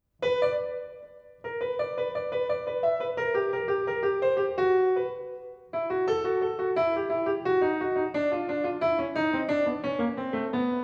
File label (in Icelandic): Fagott sónata; sónata 2 kafli bútur